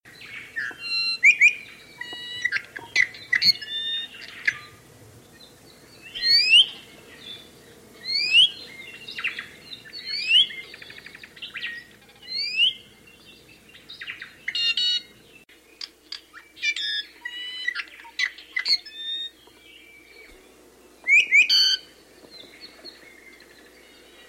Iiwi

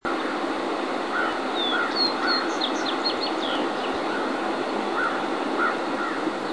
Vesper Sparrow

